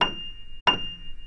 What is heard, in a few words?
F7 mezzo, pianosound